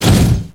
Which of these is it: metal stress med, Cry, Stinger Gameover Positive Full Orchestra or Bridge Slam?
Bridge Slam